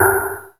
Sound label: Snare, Korg Z1